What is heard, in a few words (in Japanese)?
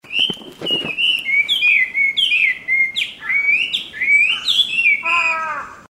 ガビチョウ